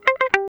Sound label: notif